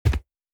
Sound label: UI Simple, Interface; Survival Game Sounds - Lite Edition